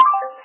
Call disconnect

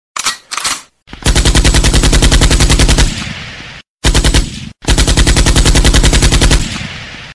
Real Weapon

